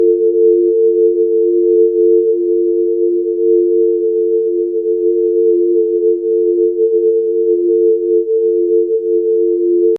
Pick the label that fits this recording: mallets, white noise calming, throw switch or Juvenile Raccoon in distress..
white noise calming